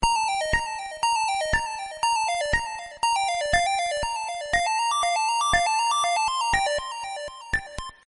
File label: 8 bit